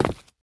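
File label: player tile, footsteps; csgom; cstrike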